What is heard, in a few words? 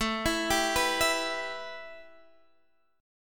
A 7th Suspended 2nd, A, down, Guitar, Half Step Down